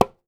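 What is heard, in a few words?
African hand drums; Voice Crystal - Steve Reid Definitive Percussion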